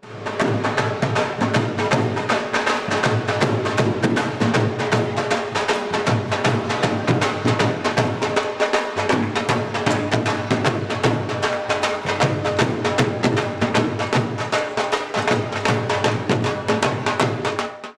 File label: dhol drums